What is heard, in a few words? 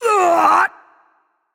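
ouch, non-verbal; voicepack; smite; merlin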